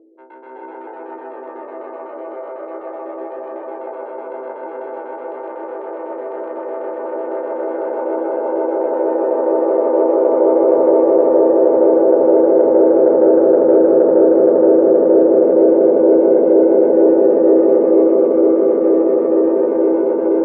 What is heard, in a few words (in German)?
Moogerfooger Fx Feedback Optionen; Amazona Moogerfooger Workshop